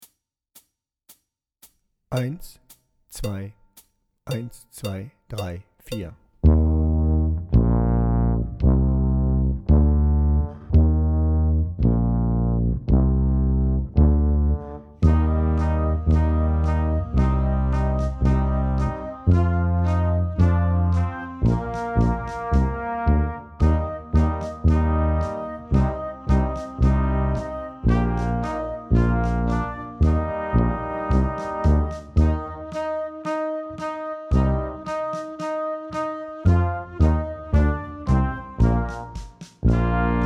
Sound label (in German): March Tuba lauter